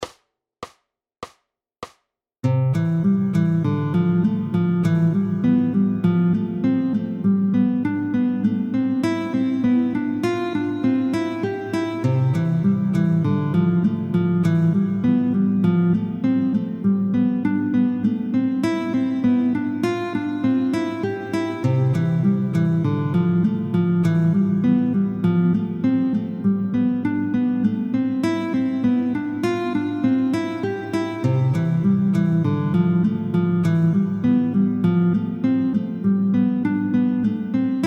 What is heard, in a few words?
Guitar Gym Sequencing oefening